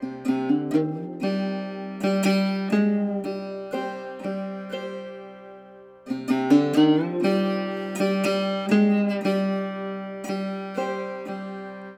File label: guitar, Sad